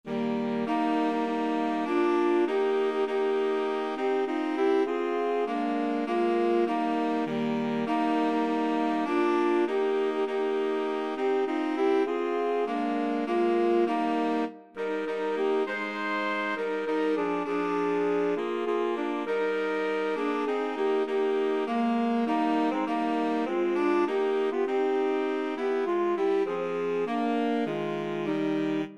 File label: 3 sax, woodwind trio; christmas trees